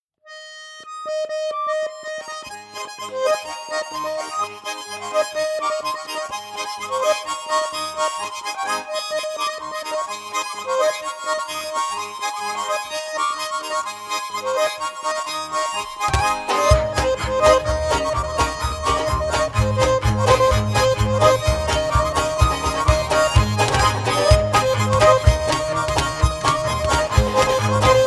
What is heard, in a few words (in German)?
Schottisch